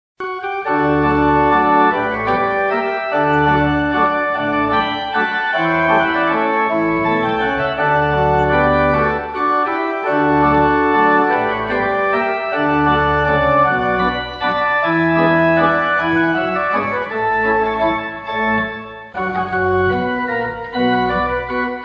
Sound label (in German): Walzer in D-Dur